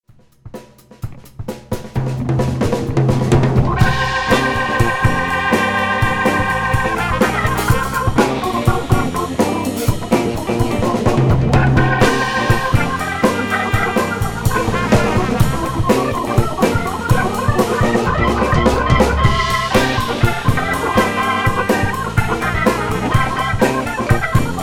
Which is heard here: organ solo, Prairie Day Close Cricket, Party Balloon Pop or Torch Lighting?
organ solo